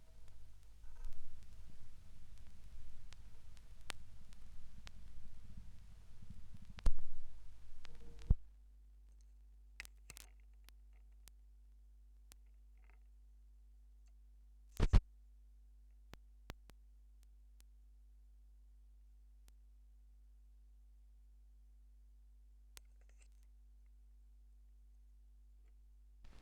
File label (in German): Schallplattengeraeusche; Vinylknistern